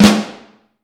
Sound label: Vintage Snare Drum, 6.5X14; Q Up Arts; Johnny.C's Vintage Snare Drum; 6.5X14 LUD